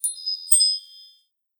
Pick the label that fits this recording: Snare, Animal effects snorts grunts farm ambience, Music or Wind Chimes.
Wind Chimes